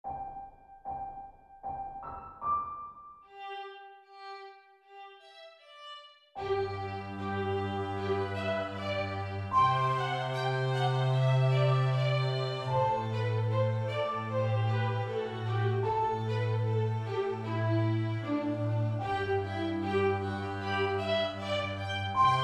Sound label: melody